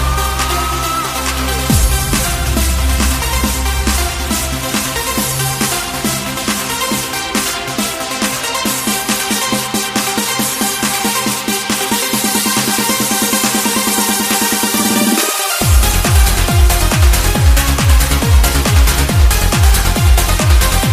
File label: ringtone